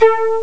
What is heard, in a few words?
d50 chiff; Amiga Soundtracker Sample Packs